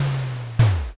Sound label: door close